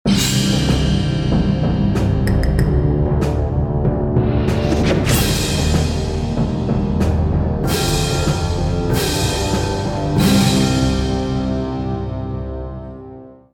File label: New deathcam music; Secret Ponchos